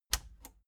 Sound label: plastic button latch scratch enable slow